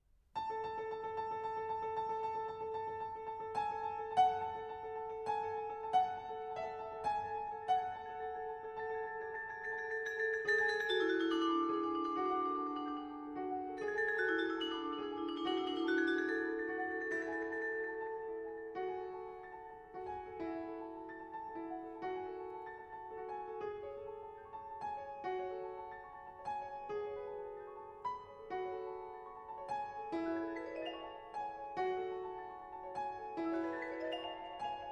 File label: toccata piano vibraphone; toccata2000